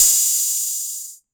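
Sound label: TR606 Hat Open, Roland TR-606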